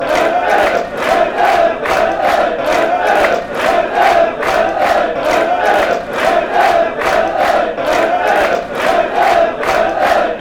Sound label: police theme tune, fanchants